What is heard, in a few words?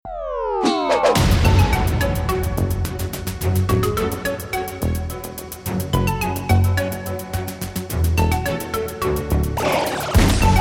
choose team